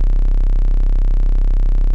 Noise Loop

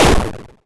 exp shortest hard, arcade game sounds, Explosions

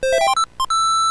Square Motif

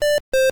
Square Motif

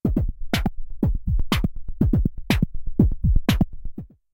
Synth Drums; Ambient FX

